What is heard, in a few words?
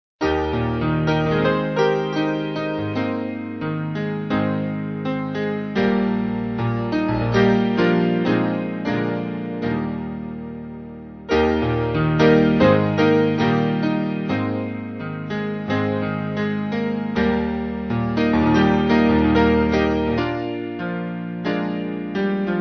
What a friend, gospel